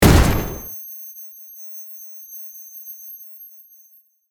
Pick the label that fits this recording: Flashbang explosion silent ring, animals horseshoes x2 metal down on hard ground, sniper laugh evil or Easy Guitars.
Flashbang explosion silent ring